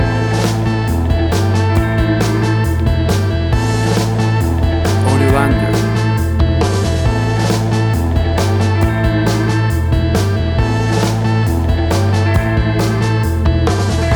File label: 60s Surf Ballad Music